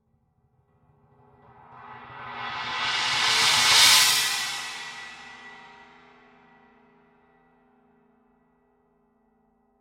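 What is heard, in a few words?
signature 18 thin china roll, cymbales; Forgées à partir d’un alliage secret de bronze; paiste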